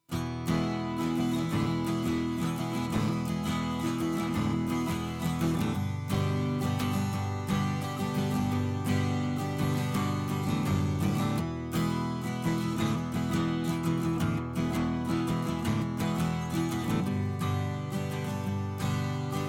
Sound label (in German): Guit, Niere, Preamp, Input voll übersteuert; Orpheus